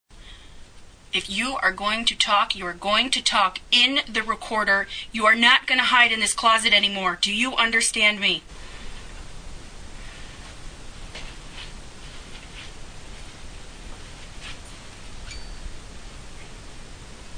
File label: xylophone